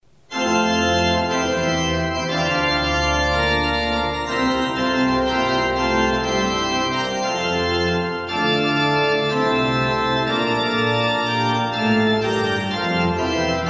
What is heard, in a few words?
Gounod Marcia pontificale